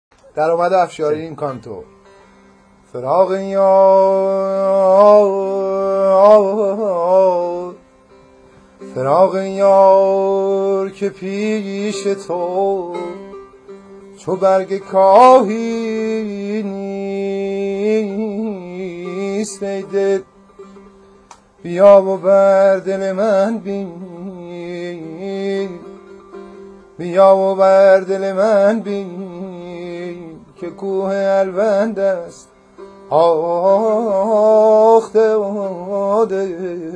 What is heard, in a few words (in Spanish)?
afshari daramad canto, Musica persa